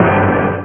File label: orch lofi